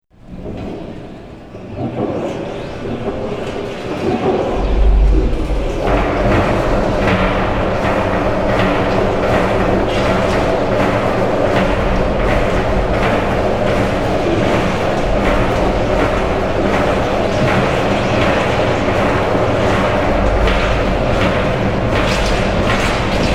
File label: big boxer cymbals crash, Mechanical Sound Orchestra; Heckert Matt